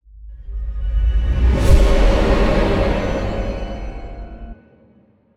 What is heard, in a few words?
Wind Intro; children of morta